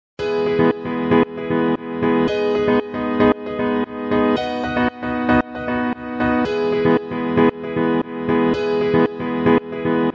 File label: Music